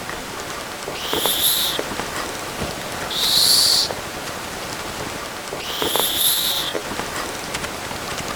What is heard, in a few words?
hissing; sugar glider; long and slow hissing